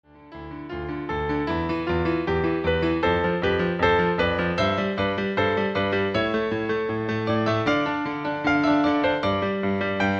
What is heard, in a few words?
Christmas Bells; Rent